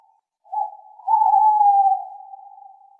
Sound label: owl hoot